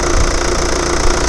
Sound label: opel astra, vehicles